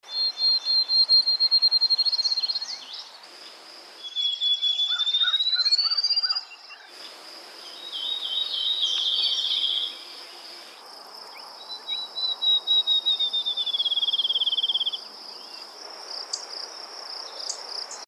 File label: field sparrow; calls; Audubon